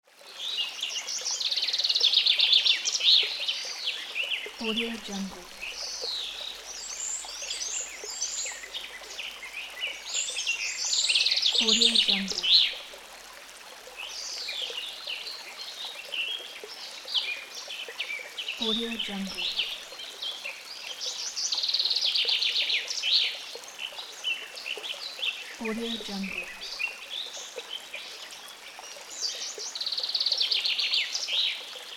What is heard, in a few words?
birds and forest stream